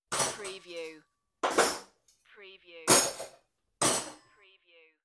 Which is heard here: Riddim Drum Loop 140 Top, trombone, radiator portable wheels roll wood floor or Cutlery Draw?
Cutlery Draw